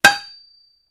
Metal Impacts, Dull; Dull Impact With Light Ring Out. - Dish Impact; pipes